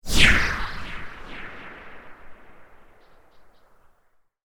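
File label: laser linear humming film and sound effects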